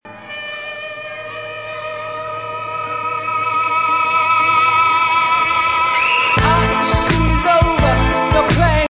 Cri

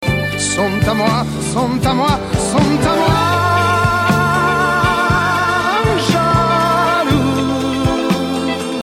Montee Modulante

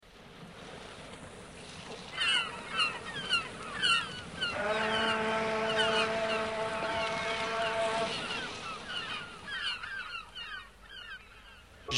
Bruits Bateau

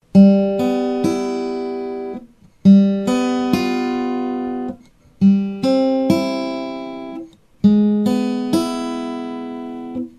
guitare Geometrique

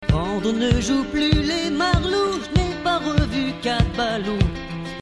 Gamme

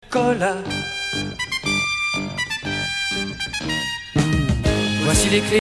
Trompette, trait de trompette

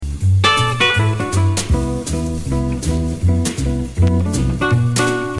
Petits Accords

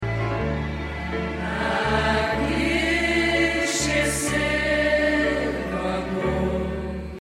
Descente Basse

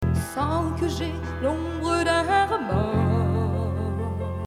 Grand Intervalle

